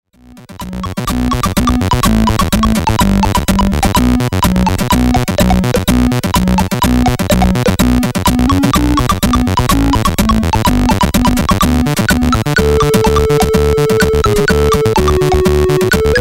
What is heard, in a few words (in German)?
nordischsound